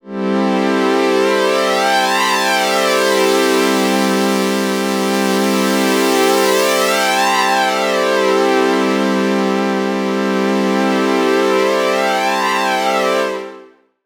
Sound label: synth pad loop phasin saw 128 C